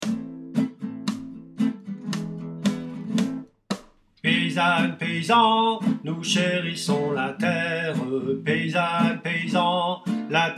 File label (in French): Basses, chorale